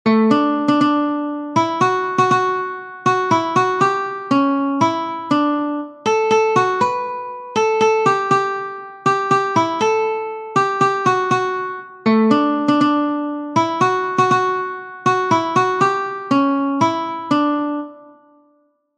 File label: O Christmas Tree Guitar Several Strings